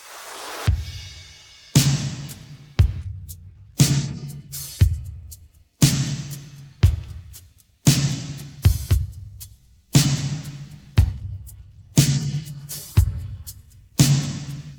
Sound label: pattern, drums